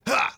Grunt Attack; Lex; Paladins

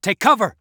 Taking Damage; Realm Royale; Assassin; Shinobi

